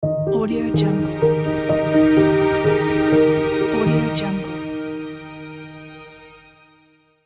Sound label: soft piano and strings logo